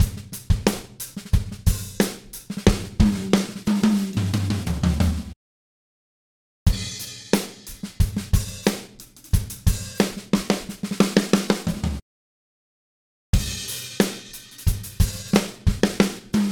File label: 70s Funk Drum Fill 90 BPM